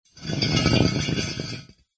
foley metal heavy weight drag scrape solid hard ground